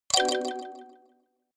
notification kalimba delay minor high

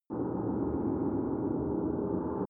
windy dark shuttle

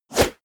wipe

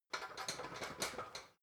ladder metal rattling

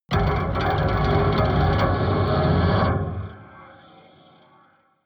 rattling metal creak

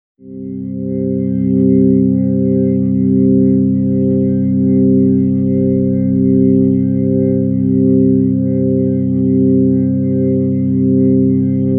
organ chord neutral c

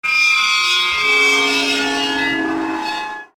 destructive metal scrapes

